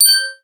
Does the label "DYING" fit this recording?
No